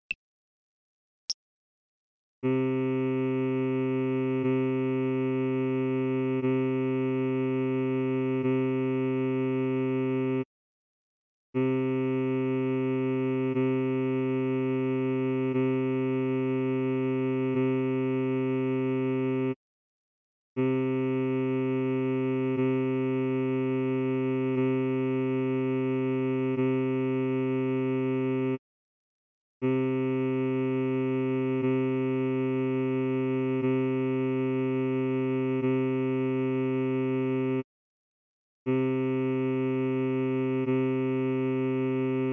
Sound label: c harmonica